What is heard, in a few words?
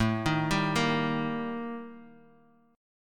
Eb Major; arpeggiated; Eb/A